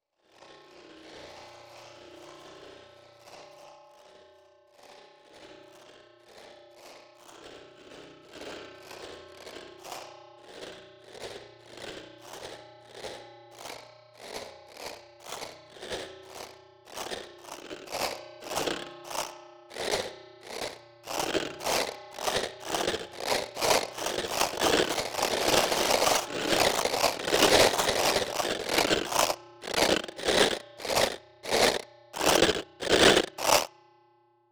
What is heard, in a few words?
scrape big